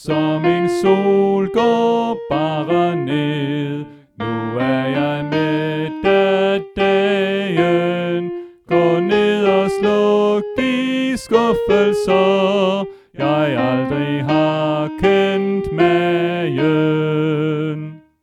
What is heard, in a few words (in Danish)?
Sopran; oevefiler